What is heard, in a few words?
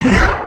idle, creatures organic